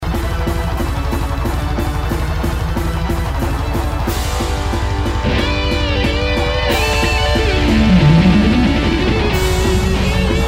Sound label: solo